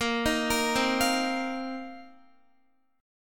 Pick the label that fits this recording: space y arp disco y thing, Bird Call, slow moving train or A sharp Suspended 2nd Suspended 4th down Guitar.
A sharp Suspended 2nd Suspended 4th down Guitar